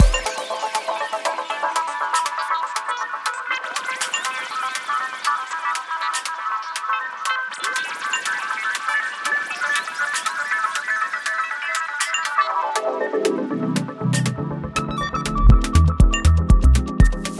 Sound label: Those Chords, 120